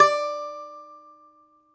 DOMRA, Prima Domra, Plucked Strings; E-MU Producer Series Vol. 7 – Old World Instruments

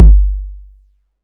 BD, Dance Mega Drums, BD HOU; Best Service

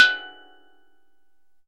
steel drum; E-MU Producer Series Vol. 2; More Studio Essentials; Composer

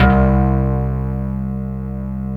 C2, Wurlitzer

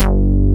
FUNKY, BASS; East West; Global Sounds

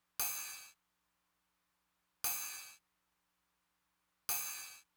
ride, Roland TR-909; drum machine; Roland TR-909 Ableton Project

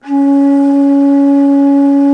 T. BONSURI, India Winds; E-MU Producer Series Vol. 7 – Old World Instruments

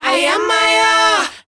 KIDS, S. AFRICAN, World Voices; ILIO; Vocal Planet

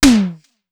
SDS2000 HT, Simmons SDS2000; SDS2000 Factory4

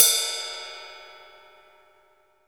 RIDE CUP, Ride Cymbal; MONO RIDE CYMBAL; AKAI S6000 CD-ROM - Volume 3; MONO RIDE CYMBAL 2

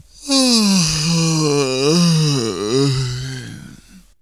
FX; AMG Megabass REMIX! VOL-2

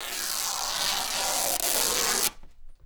rip, Canvas Bag Rips; E-MU Producer Series Vol. 3 – Hollywood Sound Effects

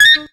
FUNKY SAX; Zero-G; Funky Elements

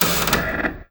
BOUND, Anime Sound FX; COMIC

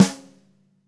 DW Green Maple Snare; 1999 DW Green Maple Kit; One-Shots; Sonic Foundry (Sony Creative Software); Vital Drums

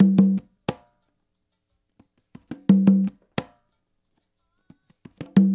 LOOP, Percussion; InVision Interactive; Percussion Slam; ZOMBIE

